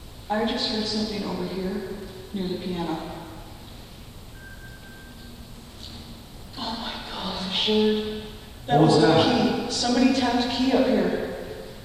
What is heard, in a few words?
piano